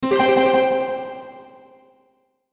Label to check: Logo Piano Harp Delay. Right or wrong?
Right